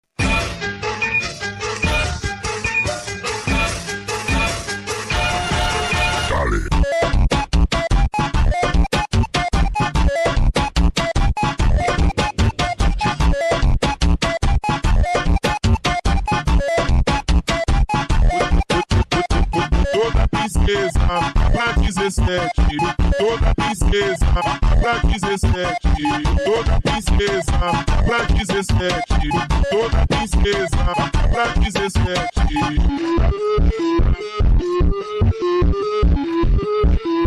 Beeper Funk speedup; tiktok Version

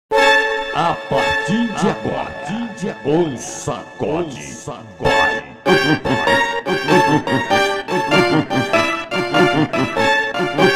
Funk Slowed reverb

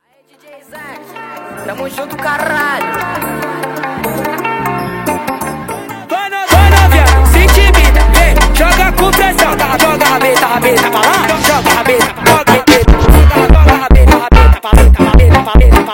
FUNK Sped Up

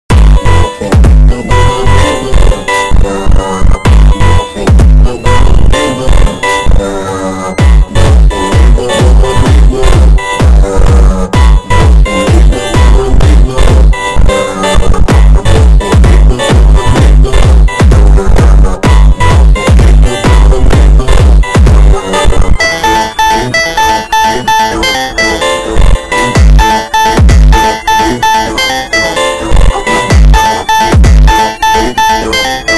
FUNK bassboost

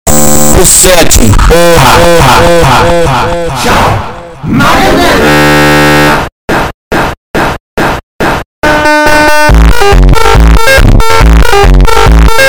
Funk, Superbass

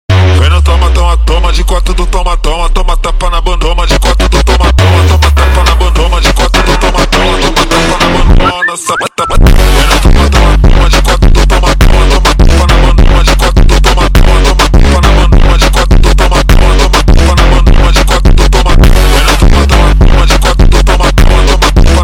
Super Slowed

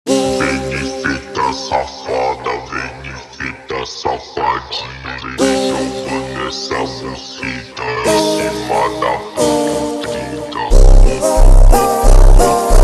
Montagem ultra Slowed

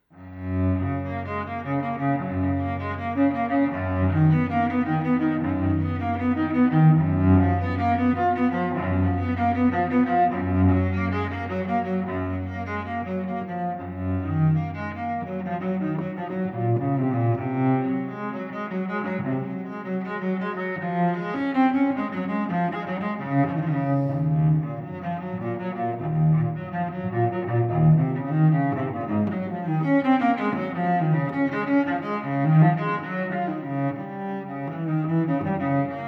Cello Suite No 1 In G Major Bwv 1007 I Prélude

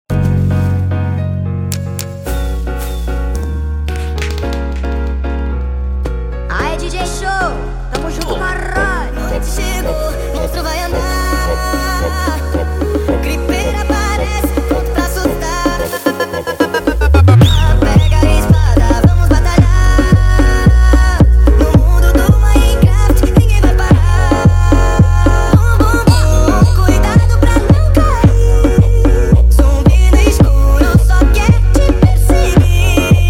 Funk super Sped Up

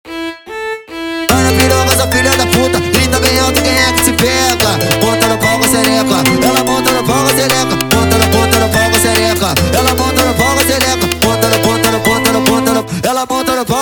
brega violino

